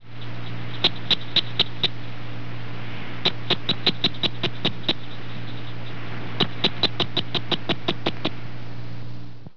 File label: cricket frog